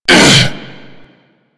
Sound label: Hammer Boss Pain, Vocals; Warframe